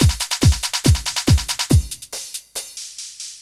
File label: techno 140